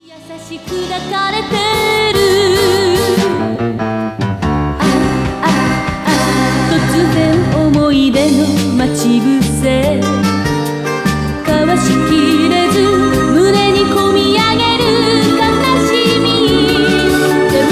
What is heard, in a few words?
idol